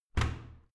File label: washing machine modern close